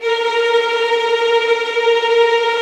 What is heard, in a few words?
TREM A#4, Violin; Q Up Arts; Denny Jaeger Master Violin Library; PERFORMANCE